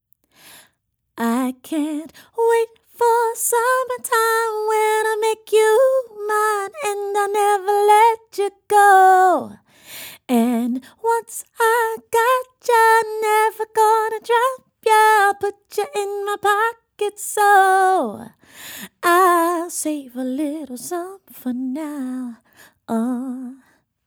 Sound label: female vocals, omni; lct 441 flex; lewitt